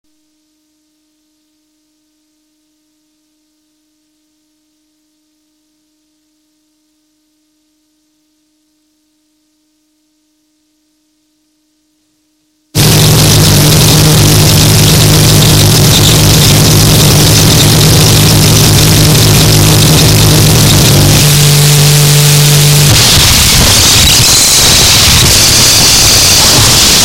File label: Morning Noise Broadcast